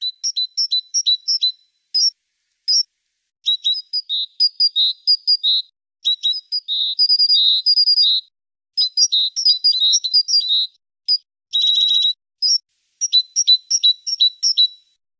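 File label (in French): mesange charbonnière, oiseaux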